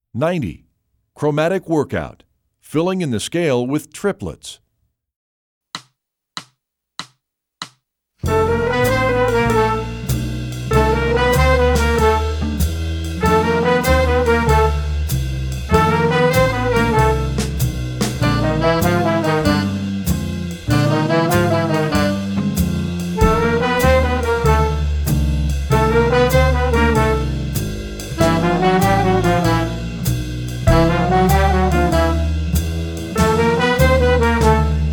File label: chromatic workout